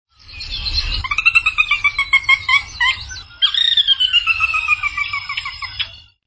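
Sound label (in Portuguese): ringtone; flamengo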